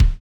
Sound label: kick, house